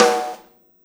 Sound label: Snare Shard